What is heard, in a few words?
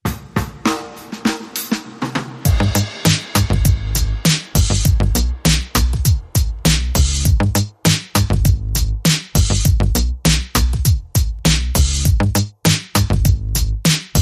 Drums and Bass Mix